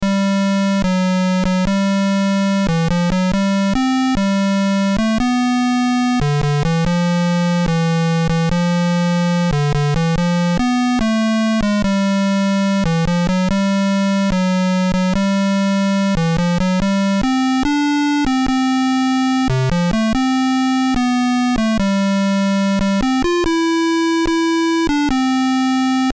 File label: March, Player Piano; BASIC Manual